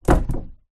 foley card box packageing plastic window down on ground